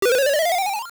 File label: Sword Charge; Charge up a Sword; Oracle